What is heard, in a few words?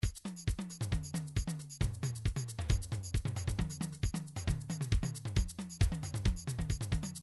trance midi loops beats tuneful; Newtronic Trance Constuction Kit